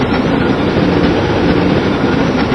streetcar; 暴力赛车

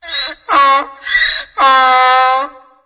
Donkey; 暴力赛车

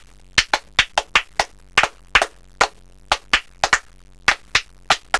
CLAPS

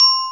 Button, 按钮; 特酷声音素材库

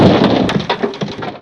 Game, 游戏音效; 特酷声音素材库

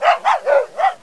DOG; 特酷声音素材库

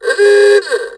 Game, 游戏音效; 特酷声音素材库

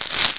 Water, 自然, 水声; 特酷声音素材库

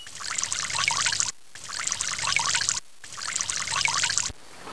Water, 自然, 水声; 特酷声音素材库

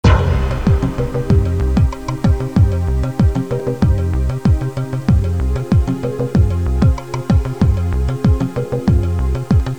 LOOP

